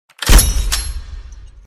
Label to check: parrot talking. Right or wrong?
Wrong